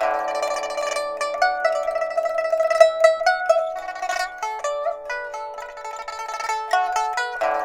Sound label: PIPA, 90; INIS - Opium